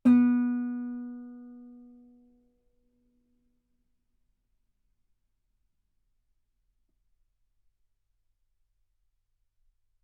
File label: Guitar B3; ff; sul B